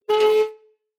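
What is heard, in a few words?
Door Creak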